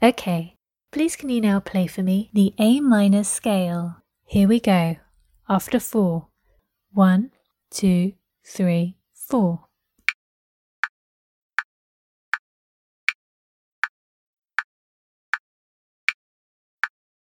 a minor scale

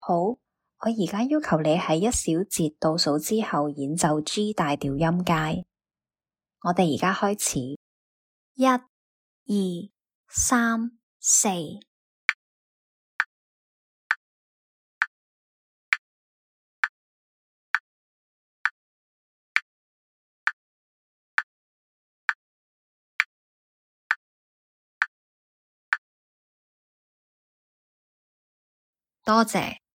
G major scale

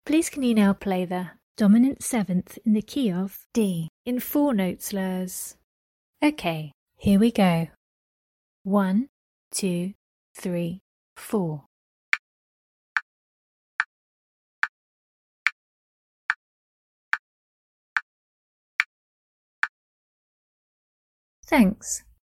dom 7th key of D 4 note slurs

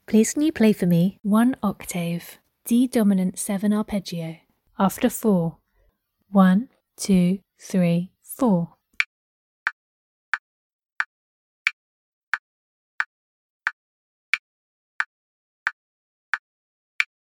d dom7 arp

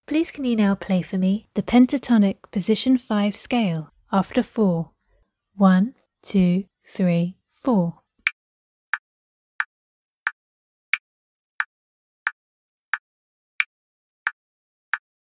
Pentatonic position 2

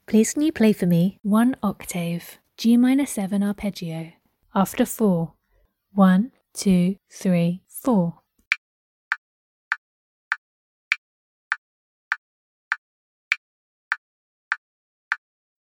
g min7 arp

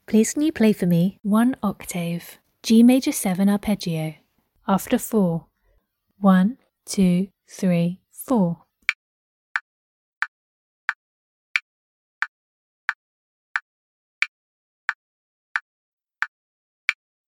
g maj7 arp

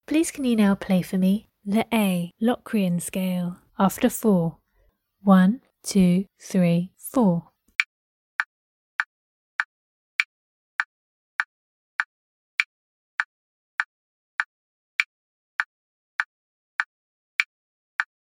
a locrian scale

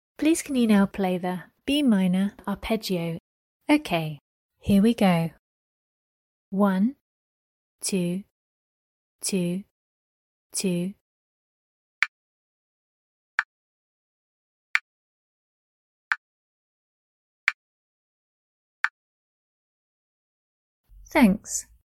b min arp

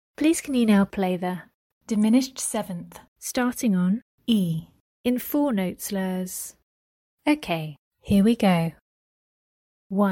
dim 7th Starting on E 4 note slurs

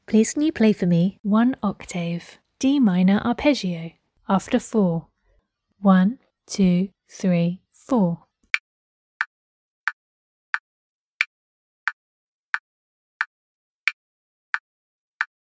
d min arp

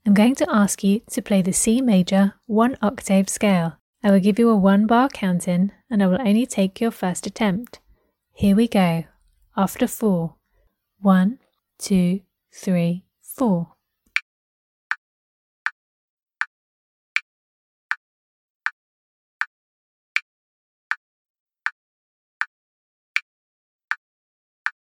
org c major scale

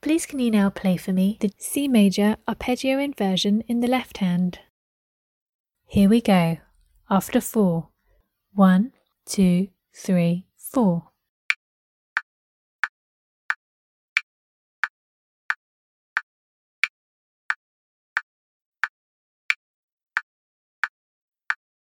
c major arp inv lh

